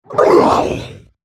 dying

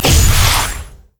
yeti ice blast; nunu

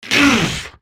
spellcast effort

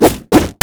bear basicattack; LoL; annie base

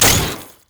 spider; LoL; elise base

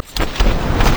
critattack; LoL; janna forecast

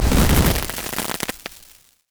fireworks fire; Fireworks Corki; valkyrie

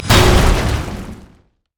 ripple effect; nautilus

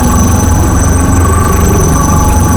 overdrive; LoL; blitzcrank iblitz

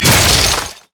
ice shard; LoL; lissandra

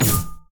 prism wave ally; LoL; lux

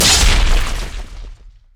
martial cadence attack; LoL; jarvaniv

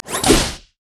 steam golem crit attack; LoL; blitzcrank

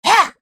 effort; Fizz

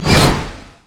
anchorchain; nautilus

